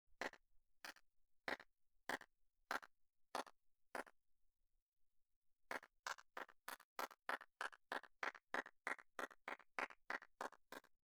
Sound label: gallery